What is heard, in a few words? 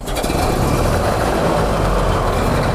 m60 engine start